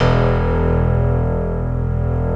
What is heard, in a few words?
DBL GRAND; OMI - Universe of Sounds